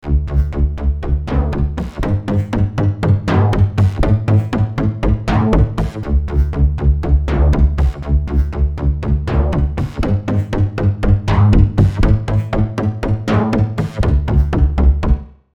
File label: Cellos on Acid